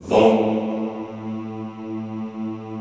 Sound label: Classical Choir Hmmm A1; Best Service; Extended Classical Choir